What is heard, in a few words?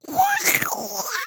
Monster Pain Death